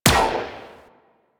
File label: Guard Turret Rifle Fire; Warframe; Venus; Venus Turrets